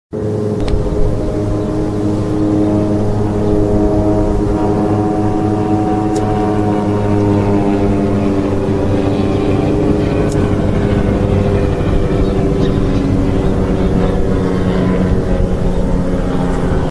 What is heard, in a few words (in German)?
Gyrocopter Schulflug